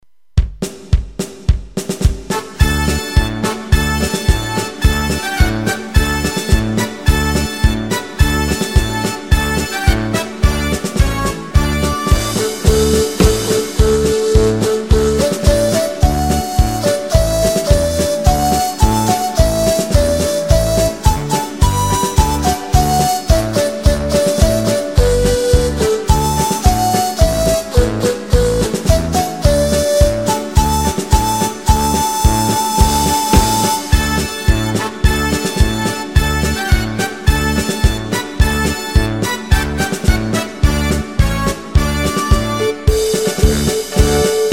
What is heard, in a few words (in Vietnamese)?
Nhạc không lời